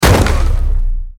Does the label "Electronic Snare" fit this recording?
No